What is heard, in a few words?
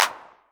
Clap, Novation Drumstation; Novation DrumstationV1